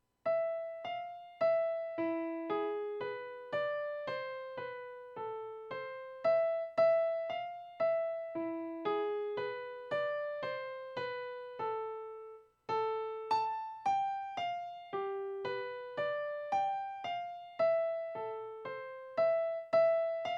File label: Ukranian Folk Melody